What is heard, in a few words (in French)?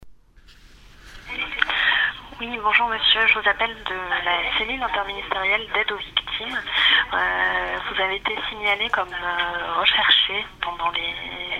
signalrecherche